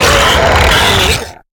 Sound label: Harpooned; Lurker; Evolve; Gorgon